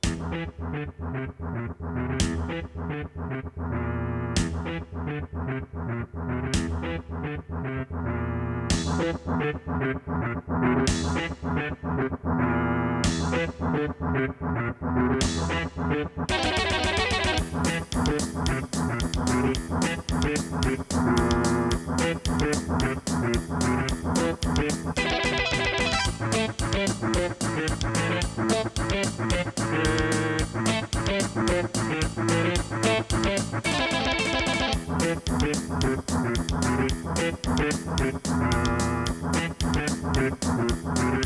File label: AWE32